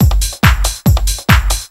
Tribal, Drums; Techno Music Maker

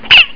squeek; JURASSIC

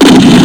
Explosion; Destruction! 2.0.1

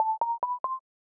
video In

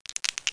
shake; CASINO; IMSI MasterClips

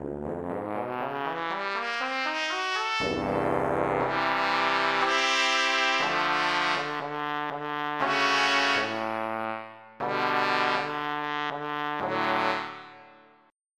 Trumpet; FF7

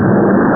thrust; Super Games Pak (1991)(Odyssey); spacewar

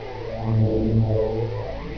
orb pulse; Hexen II; eidolon

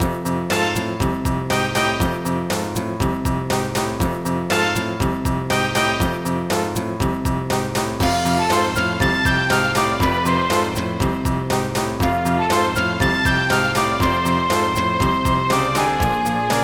baby walk

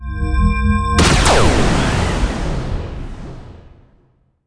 damage reduce

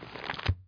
open book; Infobase

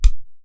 Subby click, Hits and blips

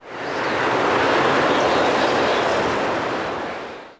wind; DAoC

